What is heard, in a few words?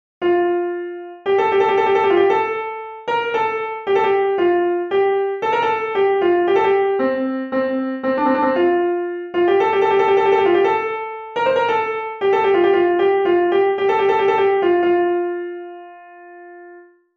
Trills Piano